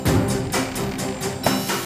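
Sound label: mountain world music cage forcefield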